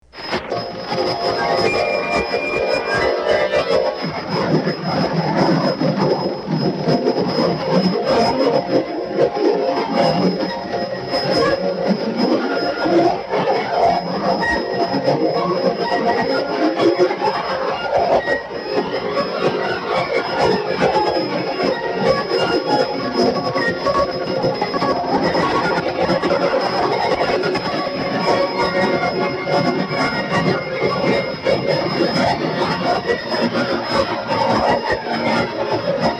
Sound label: water